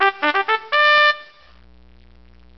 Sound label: Trumpet Fanfare; Cheesy Lo-Fi Sound Effects